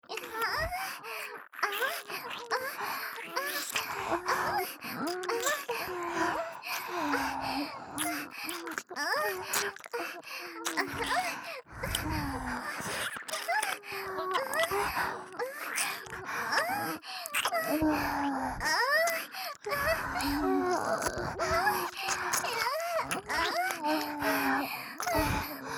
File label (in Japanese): 両耳舐め+演技喘ぎ